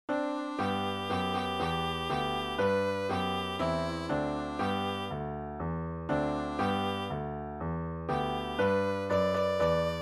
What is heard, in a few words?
solo and accomp